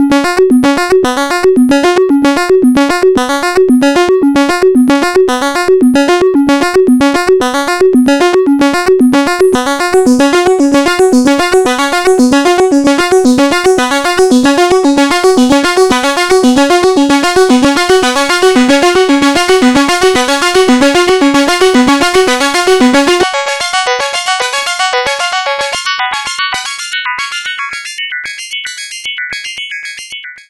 Panharmonium as Oscillator Wave via CV Bandwidth manual; Rossum Electro-Music